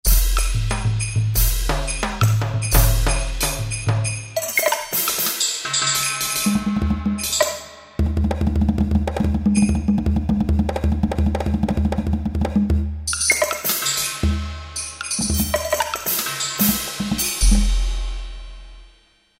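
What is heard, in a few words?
Conga & Percussion; Kurzweil K2000 Orchestral ROM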